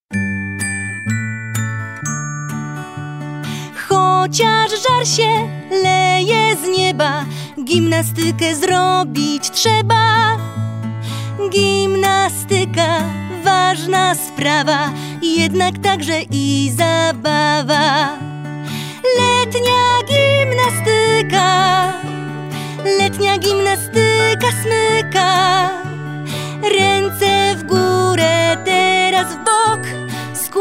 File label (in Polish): letnia gimnastyka wersja wokalna